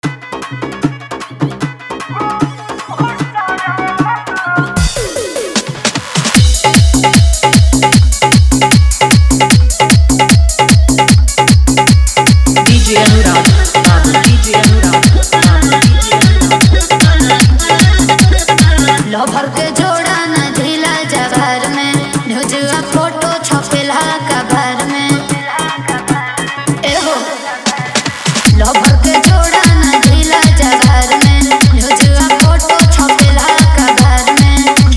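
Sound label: Dholki Bass Mix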